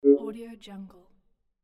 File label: modern screen item display; mlnobre